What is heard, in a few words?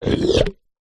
cartoon slide unblock; zapsplat